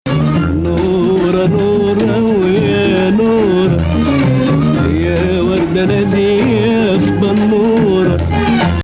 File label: Bayati Bayati (Husseini